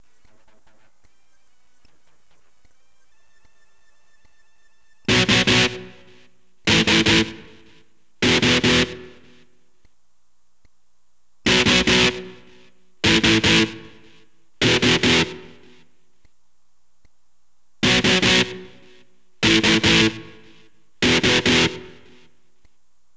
E GTR POWER CHORD

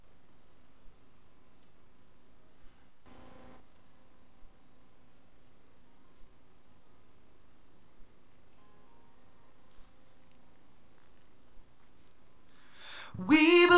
Lead Vox Recording

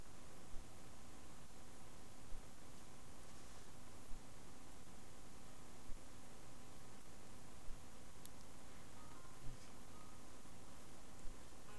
Lead Vox Recording

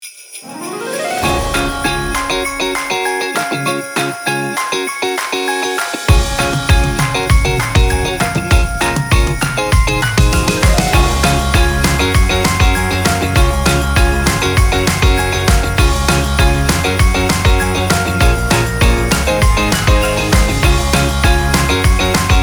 christmas rock; Christmas Pre-Service

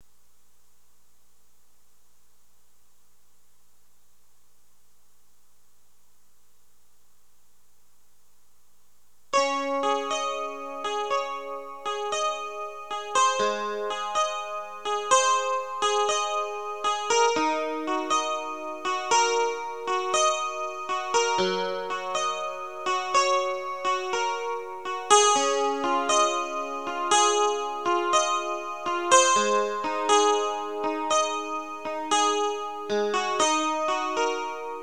Clean Gtr Chorus Recording

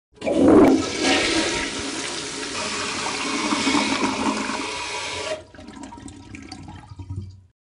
Sound Toilet (Retrete)

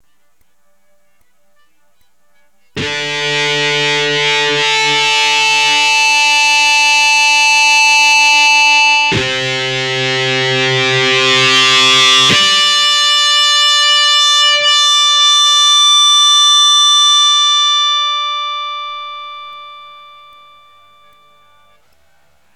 E GTR POWER CHORD

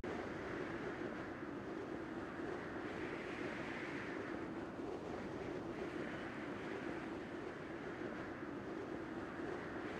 action falling; Roblox

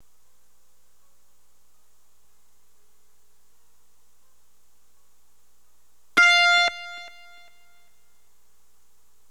Pad Recording

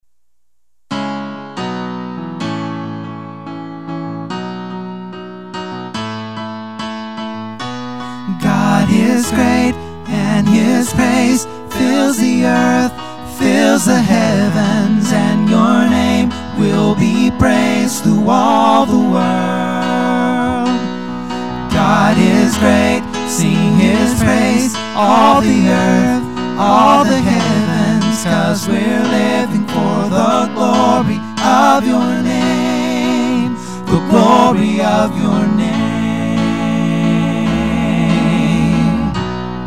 God is Great Chorus (Alto Left), Vocal Helps

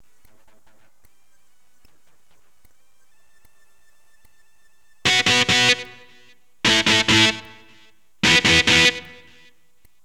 E GTR POW CHORD

